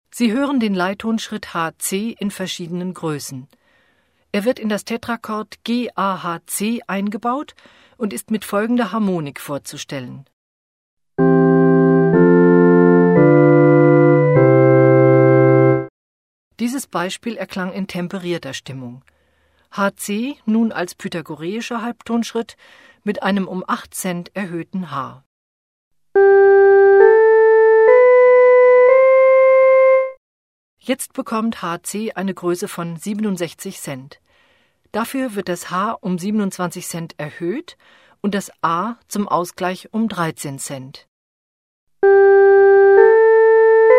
major scale with smaller and bigger leading tone thirds